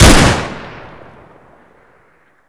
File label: saiga; garrysmod